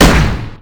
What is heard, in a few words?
pp7 fire, weapons